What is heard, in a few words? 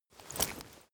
leather metal walk normal single